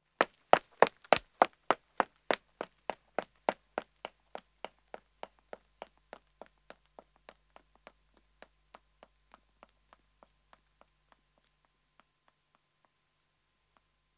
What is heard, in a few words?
Pavement Running man departs, Footsteps; The BBC Sound Effects Library